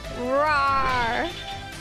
roar

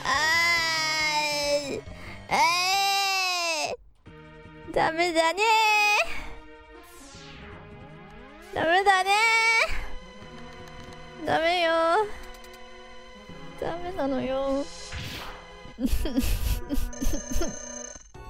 Singing; IRySinging

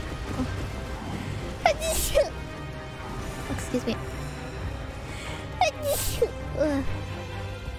Sneeze

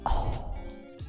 ohhhh

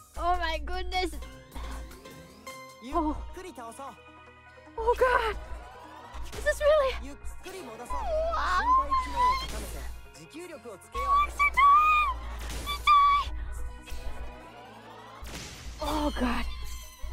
noises

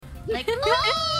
giggles

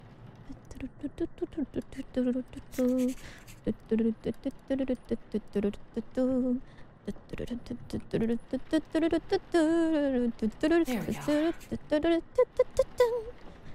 Singing; kirby ost

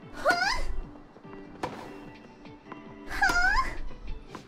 tennis noises

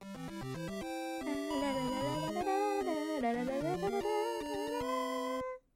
Singing; Mario Bros Victory song; IRySinging

